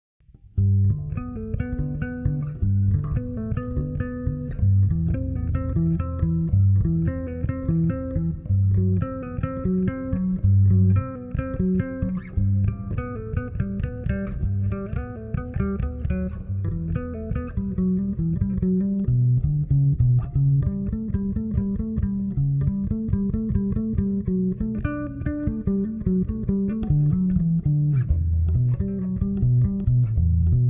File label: Bass guitar